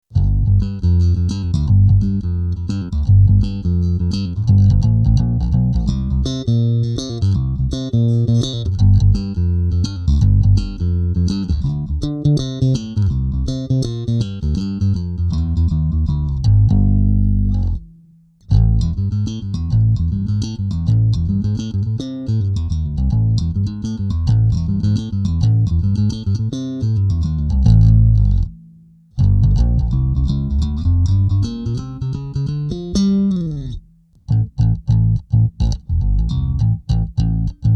markbass; zoom b3

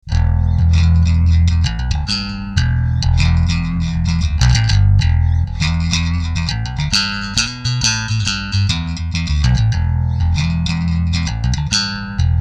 riff

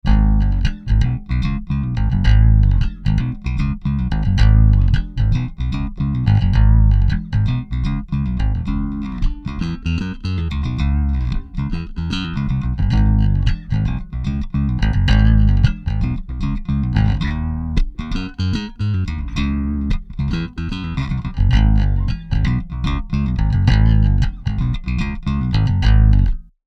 riff Ampeg SVX DI SVT-CL

